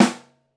Snare Mono

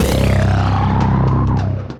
Deep Impact Engine, tense pulses; Ableton; Mood Reel

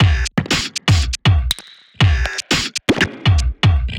Demon Kit, Vocal Percussion; Instruments; Ableton; Factory Packs; Voice Box

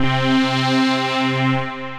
Synth Pad; Neon Heaven

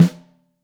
Snare Top; Ableton Foundation FreePacks; Snare Designer

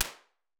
Recording Booth; Ambience and Small Rooms; Ableton; Convolution Reverb

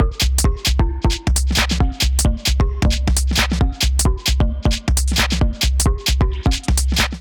Kit Melodic 133 BPM, Drums, Complex; MIDI Clips; Dear Ribane Kit; Ableton; Factory Packs; Skitter and Step

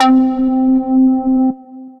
pluck, guitar & plucked; Focal Pluck

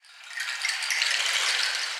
Quartz Rainstick; Ableton; Mood Reel; Playful Percussion

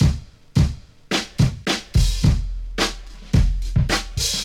Drum Break Hip Hop; Ableton; Breakbeats by KutMasta Kurt

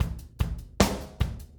150 Ska Drums; Pop Rock

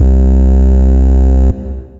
Antidote Bass; Synth

